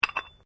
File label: Weights Dumbell Plates Move Soft, Gym weight lifting equipment